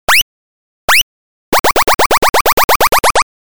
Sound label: tone